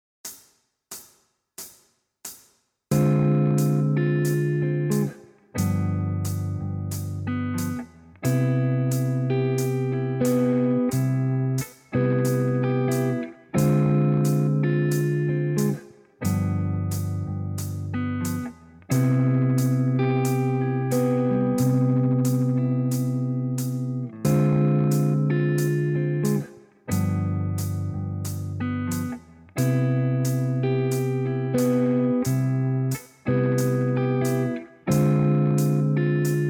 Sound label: Backing Track Jazz Progression II V I; guitar scale